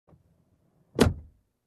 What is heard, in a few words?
Closing Car Door